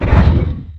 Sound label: Mudraptor Damage; Barotraumatic; Cthulhu; InsanityMonsters; Hallucination2